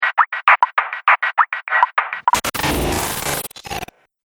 Radio Stinger

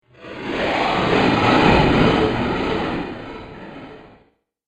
Dimension Deconstruction; Sci Fi Sound Effects; Science Fiction Sound Effect; Andrew Fleming

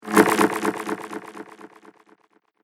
Liquid Laser Delay Whoosh; Whoosh Sound Effect

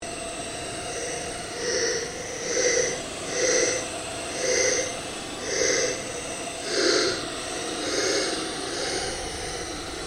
Ambient Drone Ghost Chatter; Sound effects created by manipulating other sounds; Scary Soundscape; Scary Soundscapes

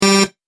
Gameshow Buzz In, electronic; Miscellaneous Electronic Sound Effects

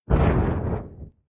Whoosh Fireball Flame Ignits Blasts Outwards; Battle Sound Effects

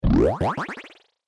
Space Age Twang

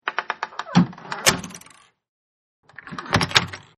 Door close, Creepy Door Close; Miscellaneous Doors (Sound Effects:Doors)

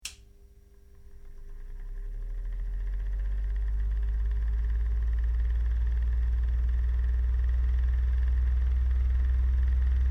Desk fan small on; Stuart Duffield

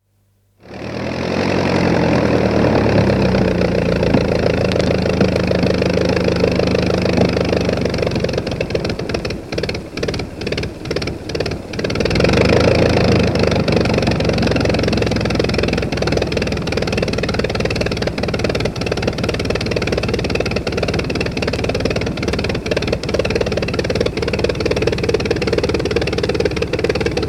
Motor Boat: Starting, ticking over and stopping; from 78 disc; BBC

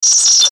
static high; Static High Scrape; Production Element Imaging Element Accent Transition; Blastwave; Static Sound Effects

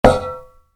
Shovel, Impact; Dull Hit; Yard Tools; Yard Tool Sound Effects; Yard Tools (Sound Effects:Tools); Stuart Duffield

